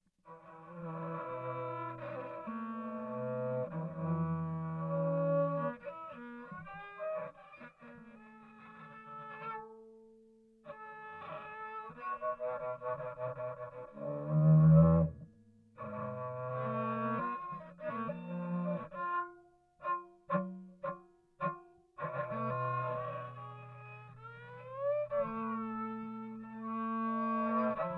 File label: suction cup violin, contact microphones